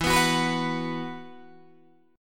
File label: E Suspended 2nd E, Guitar, DADGAD